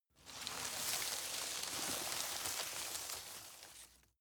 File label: grab rustle medium